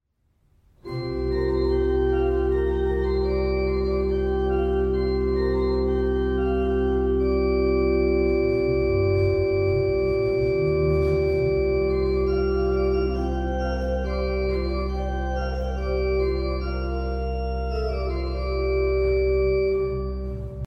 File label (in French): Postlude orgue